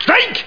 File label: Menu Popup; TV Shows; Plus!